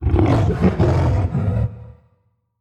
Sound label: CHARYBDIS attack